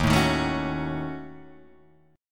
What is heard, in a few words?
Eb Minor 7th Flat 5th, chord; strummed